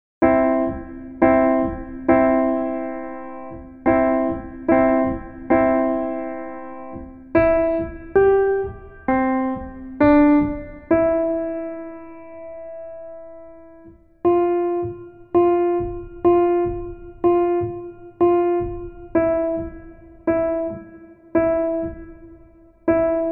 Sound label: Jingle Bells, student recordings